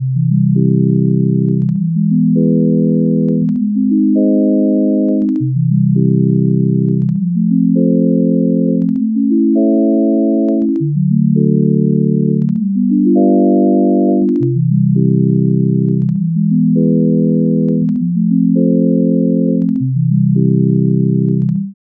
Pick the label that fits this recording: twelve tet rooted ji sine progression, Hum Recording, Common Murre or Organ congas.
twelve tet rooted ji sine progression